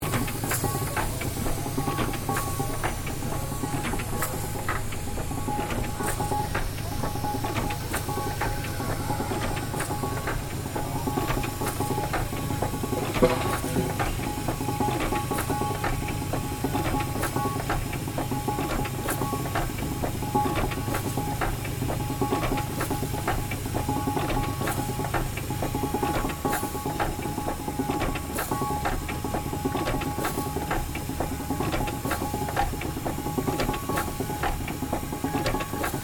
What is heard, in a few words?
Tractor steam traction engine 1900 idle; John Fowler; Vehicles